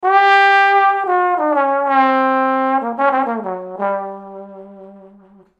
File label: Trombone